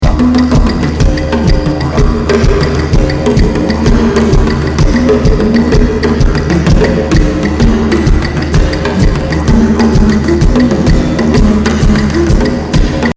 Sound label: Afro Rhythm